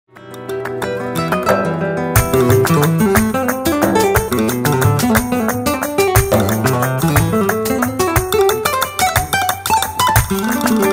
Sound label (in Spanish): Falseta, Flamenco